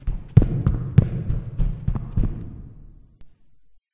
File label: run; CHica; Five Nights at Ronald's